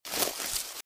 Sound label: Footsteps - Bush walk - Left; Eden; RadwinIsland